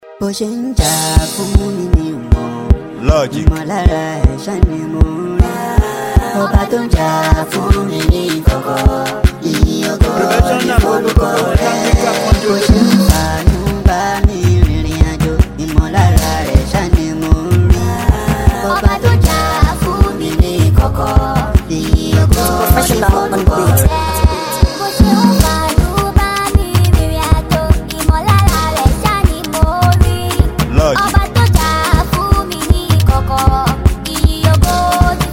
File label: Professional Beat Speed Up Speed Up